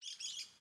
Grito Unico de Periquito Verde; aves; Coleção Sonora do Cerrado

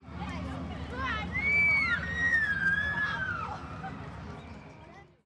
Criancas Gritando em Escorregador; multidao; Colecao Sonora do Cerrado

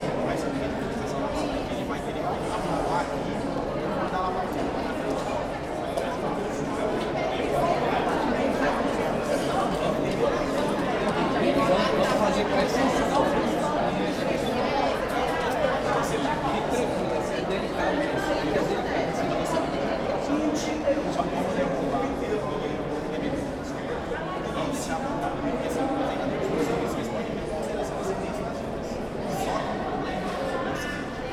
Vozerio no corredor; multidao

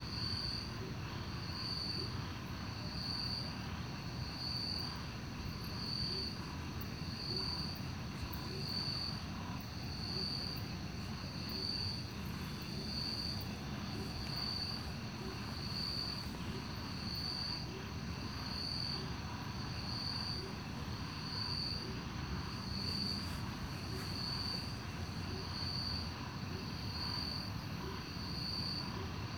Coro de grilos no parque beija flor a noite com passos na grama, passaro; Cerrado; insetos; colecao sonora do cerrado

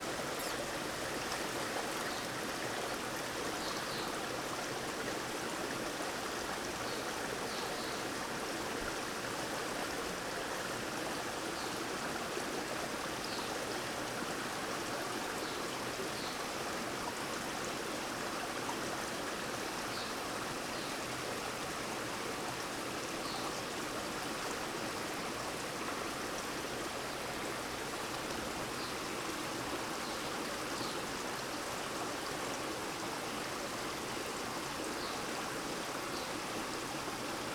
Beira do rio com passaros; agua

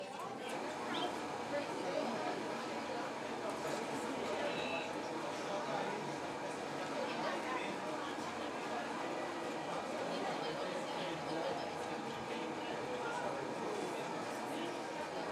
Ambiencia na Feira de Alto Paraiso com Ruido da Maquina de Caldo de Cana; Cerrado

